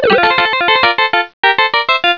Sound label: door door; ドアドアmkII; V２モード：FM音源; ＰＣ８８０１